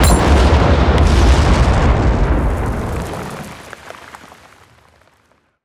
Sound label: explosion mine; Explosions; PremiumBeat